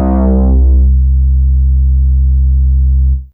Rotund Bass C2, Fairlight CMI IIe

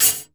KNIFE, hi-tech kits; Crypton - DrumBase5000

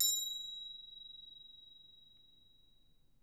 Percussion A#4, Harp; Miroslav Vitous - Percussion & Harp

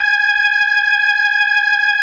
G#5F, B3, Vintage Keyboards

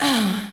vocal

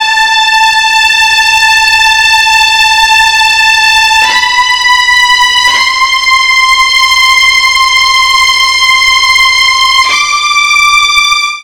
A min 165bpm, Strings, Drum & Bass; Adedgio 165; Total Drum&Bass

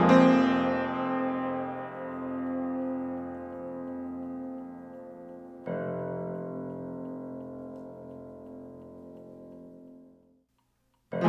Piano, 70s Analog Funk; Sonic Foundry (Sony Creative Software); What it Is! 70s Analog Funk

